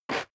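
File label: step dress boots concrete grit slide short, footsteps; schritte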